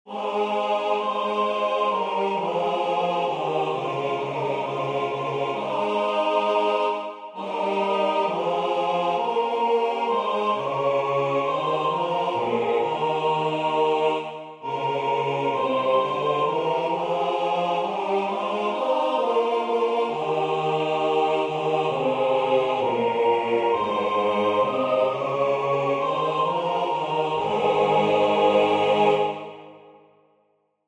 wat vrolijk over u geschreven staat B

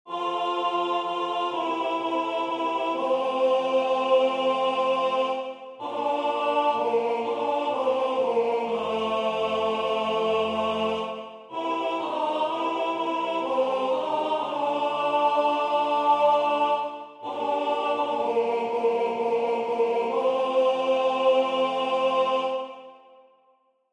Lijze rijselt die snee A

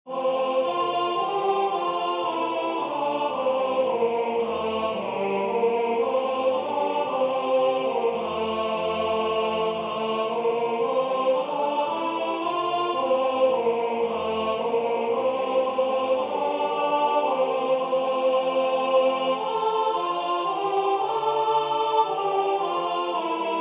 Het lied van de dag des Heren alt

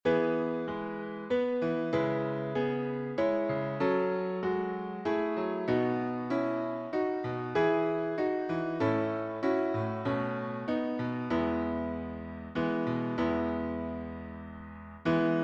Schriftlied Alt

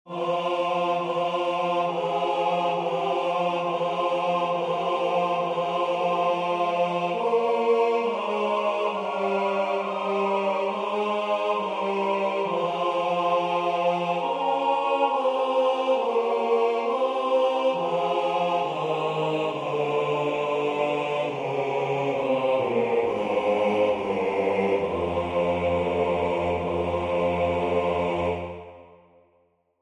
zeilen zonder wind B

